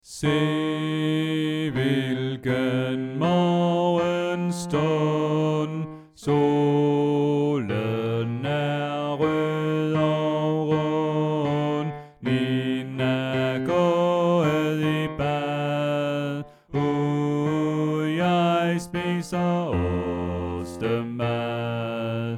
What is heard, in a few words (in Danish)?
Bas; oevefiler